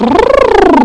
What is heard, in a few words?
Smart Wipe; games-for-fun; Recycle